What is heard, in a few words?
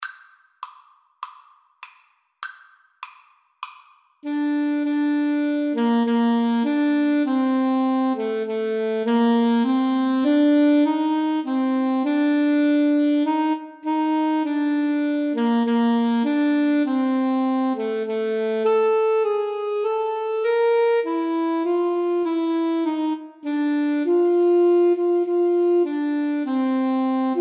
america the beautiful A SAX duet